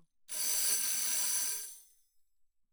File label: tambourine thumb roll ff; Percussion